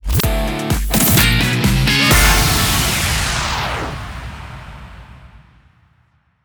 Stinger Winning 128bpm KEY d; ST Costume Party; Contest Kit

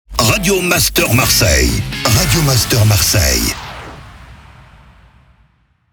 Jingle MEDIUM SPEED; Radio Master MARSEILLE

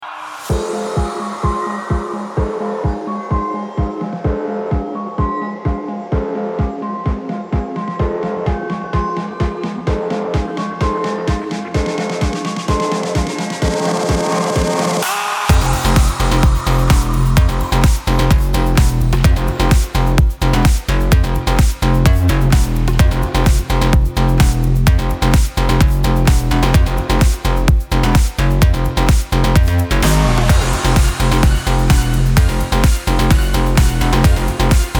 Bass 128bpm; KEY f A; MB The Ghost Bass

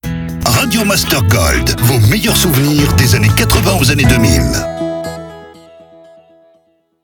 Jingle SPEED; Radio Master GOLD

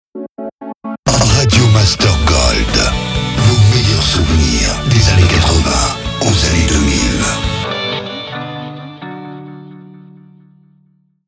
Jingle SLOW; Radio Master GOLD

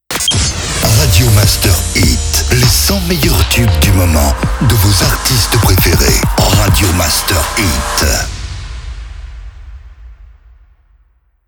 Jingle SLOW MEDIUM; Radio Master HIT

